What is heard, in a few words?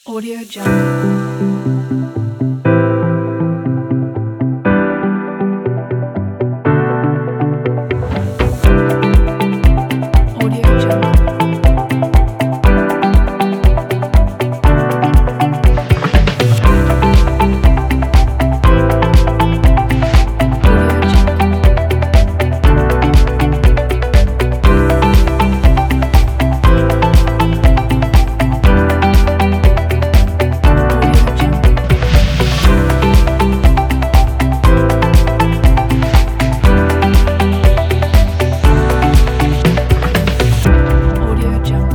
epic technology